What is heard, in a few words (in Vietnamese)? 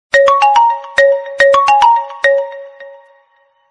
khong loi vivo v25 pro 5g